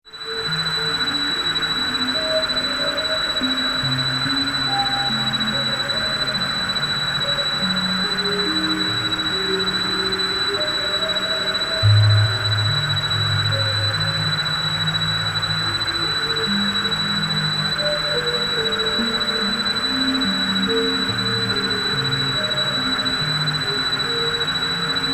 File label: Drone SciFi Tonal Musical Spacecraft Prep For Launch; High Pitch Bed With Beeps And Blips; Sci-Fi (Sound Effects:Science Fiction)